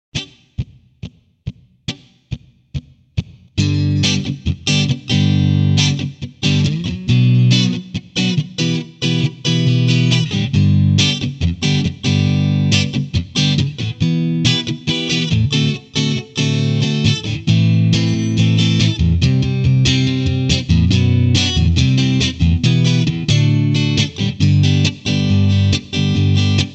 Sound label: Lead Playing Sample Chord Progression, electric guitar; electric guitar performance diploma